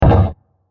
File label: Small Scratch Hit; Blasts; Impacts; Sound Effects:Impacts; CraftedSignals